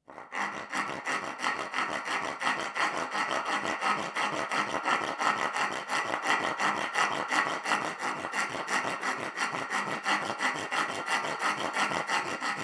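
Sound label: file sound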